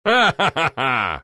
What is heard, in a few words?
laugh; dota; kunkka